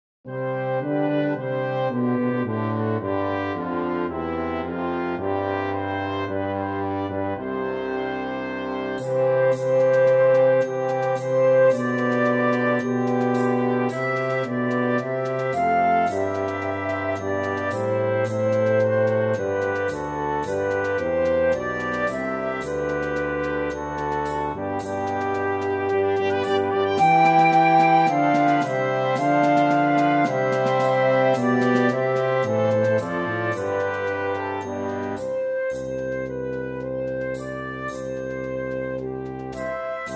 Flute track with Melody; O Come All Ye Faithful

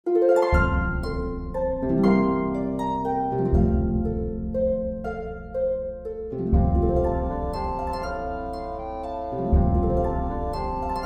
Bassoon; Silent Night

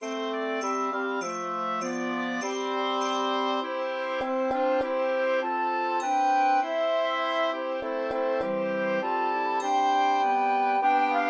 Flute Track with Melody; The Holly and the Ivy

